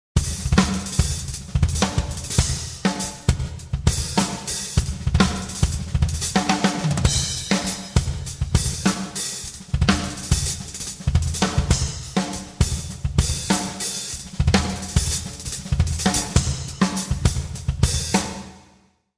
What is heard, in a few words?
solo